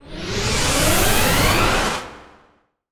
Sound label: Duel Entry Loop